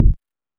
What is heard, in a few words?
Techno B; EMU XL-7